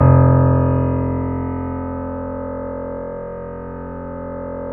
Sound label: BOSENDORF; E-MU Producer Series Vol. 2 – More Studio Essentials; Keyboards; Bosendorfer